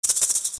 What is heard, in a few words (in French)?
Herbe; Plantes; minijam-166